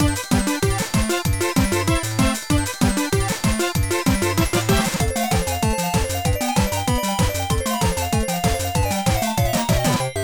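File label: background music minigame fever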